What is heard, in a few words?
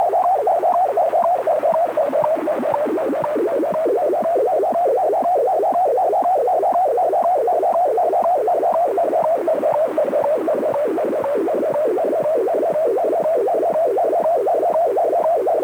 8mhz 10mhz digital; transmission